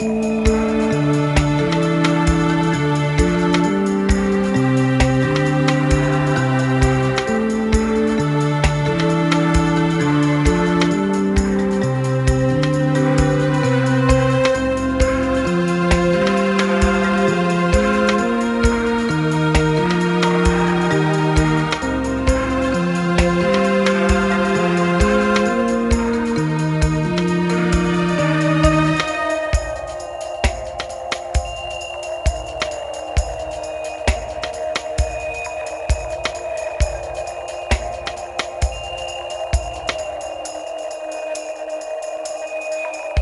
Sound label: Music; Resource Rodeo